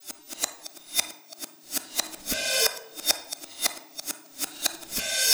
Cymbol Particle; Particles

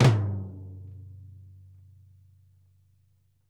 FLAM RACK, TOMS; Sampleheads; New York City Drumworks VOL-1; SP TOMS

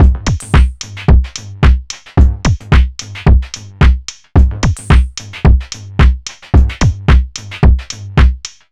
groove boy 110bpm

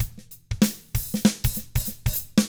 95 funk, drums; ILIO; Double Platinum Drums 1

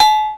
MR16 LO AGOG; Back In Time Records; Korg Universe VOL-1; DRUM FAMILY

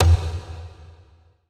E LOG SLP, ETH DRUMS

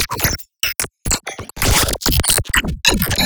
Nice Glitch

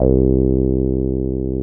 TB C5, TB-303; Masterbits; Vintage Voltage

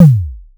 Star Instruments Synare PS-1

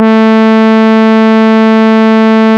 SOFT BRS, JP6 Brass; E-MU Producer Series Vol. 2 – More Studio Essentials

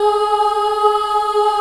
G#3 child ah, choir; USB Soundscan vol.28

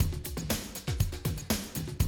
120, Classic Drum Loops

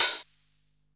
Open HH, Cymbals

